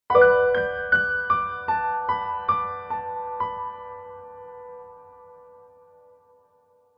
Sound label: jingle